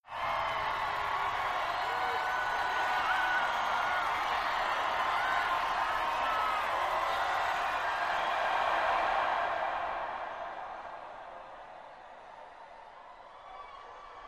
Arena Crowd Ch Whoos, sports arena

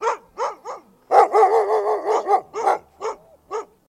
Dogs Barking One Clos, husky

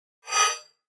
Mouse Over HF Elect, mouse over

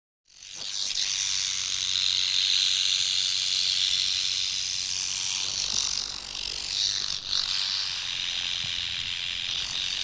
Rattlesnake Tail Rat

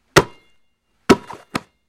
Football Heavy Hit B, football

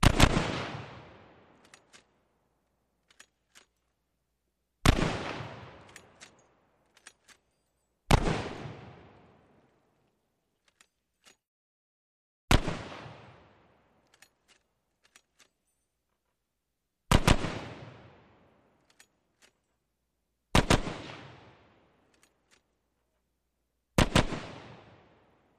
.50 Caliber: Double And Single Fire And Reload.

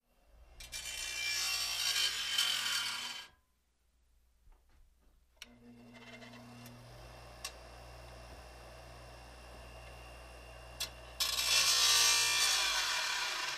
Elect Grinder Grindi, grinders

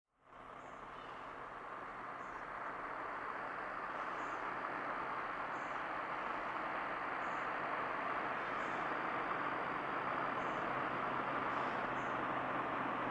Montero App Med Off, 1998 mitsubishi montero ext.

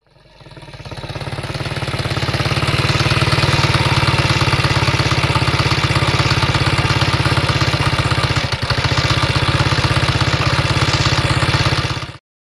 Stop Off Motorbike, vintage

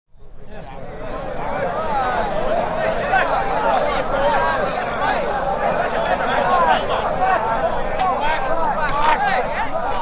Infantry Deserts, infantry walla

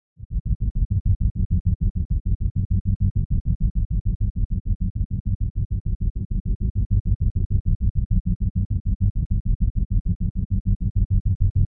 Slow Heli Propeller

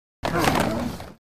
Football Blk Grunt, football

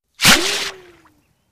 Bullets Slice Water

